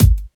crispy steel kick drum sound G sharp key, kick drum sample tuned to the G# note